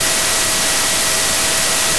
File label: additive cello single; euclidean; FFTimbre